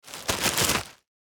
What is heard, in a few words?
Thin shopping bag